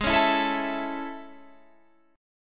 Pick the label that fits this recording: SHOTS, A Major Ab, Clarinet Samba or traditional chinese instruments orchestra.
A Major Ab